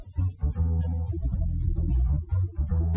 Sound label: Latino